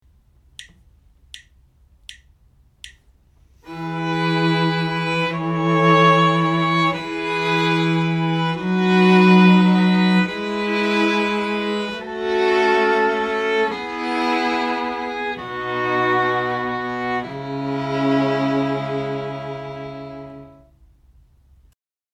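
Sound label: harmonic dictation, string quartet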